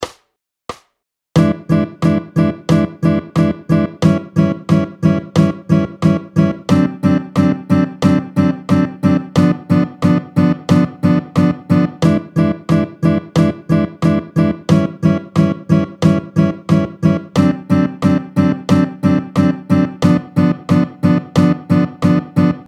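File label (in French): Vite 2 2 tempo 180 Rrythm changes A, Rythm changes A, Vite, tempo 180, guitare; Ryhtm changes A. Vite, tempo 180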